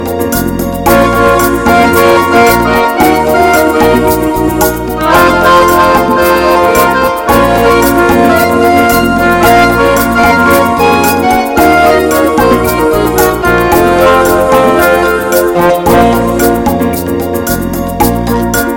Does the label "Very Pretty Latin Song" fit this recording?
Yes